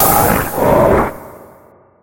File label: cry